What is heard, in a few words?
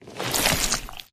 humanform longmove, flood; predatorcz; halo